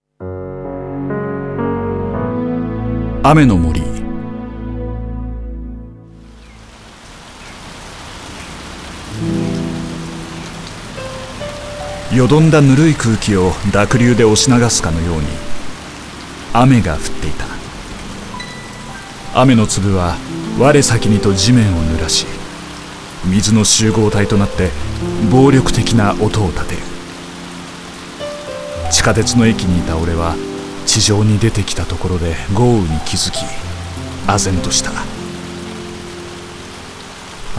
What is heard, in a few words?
rainforest